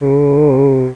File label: ghost; Sound Effects for Multimedia - Volume 1